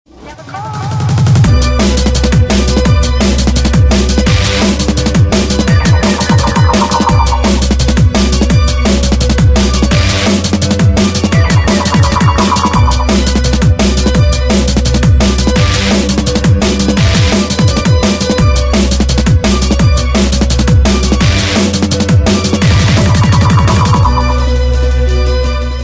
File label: Dnb